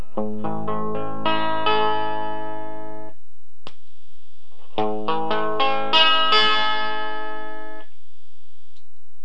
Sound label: Basic Treble; BearBoostPlus